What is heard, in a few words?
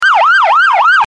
Police sirens; Sound FX